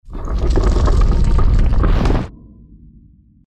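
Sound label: pyramid grow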